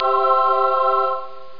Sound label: DOOR FND; PC World Komputer 1996 February; virus